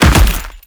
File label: Land Heavy Dirt, Footsteps; Enemies; Warframe; Teshin